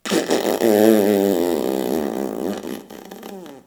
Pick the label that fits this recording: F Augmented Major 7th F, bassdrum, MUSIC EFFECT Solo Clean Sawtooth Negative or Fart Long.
Fart Long